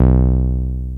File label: 303 BASSES; Pro-Rec - Synthsonic